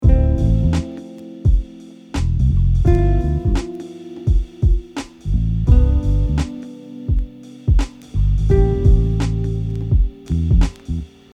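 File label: targeting 5ths chord tones, guitar; targeting 7ths chord tones; Targeting 7ths example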